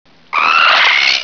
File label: headcrab pain; gearbox